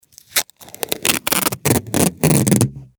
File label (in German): office klebeband